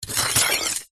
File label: knife scrape dirt